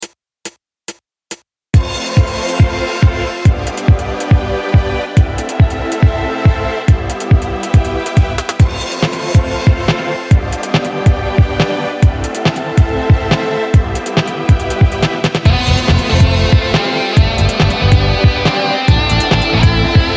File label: bigbeat